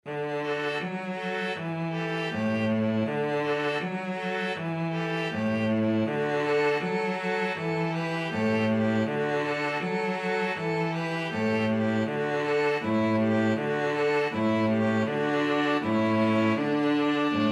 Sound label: string trio